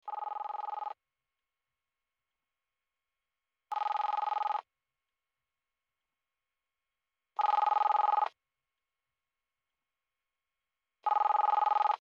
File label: call